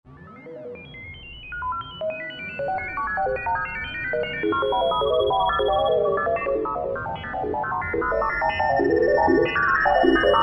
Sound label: ComputerRoom; Roland JV-2080